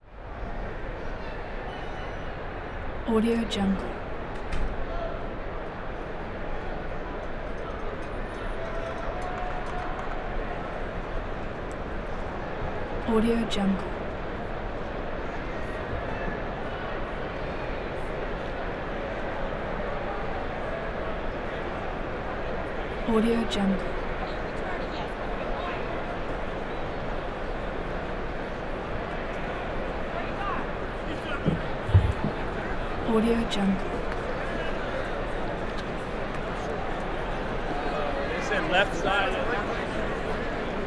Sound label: crowds stadium stadium interior heavy door open; human sounds